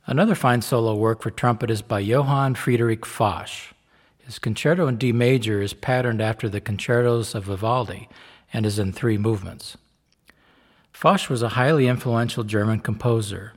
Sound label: Concerto in D Major, Baroque Trumpet